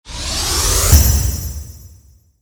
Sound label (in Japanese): ガチャ インパクト タメ有り モノラル